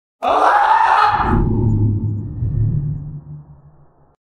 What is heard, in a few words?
scary sounds scary sounds creepy